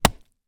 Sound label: industrial axe hit tree stump; zapsplat